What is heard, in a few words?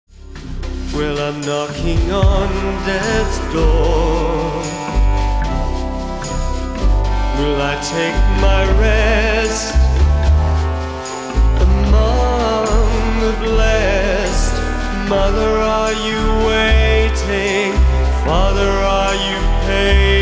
jazz; deaths door